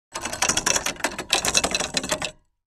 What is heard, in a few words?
Old rusty paint roller squeaking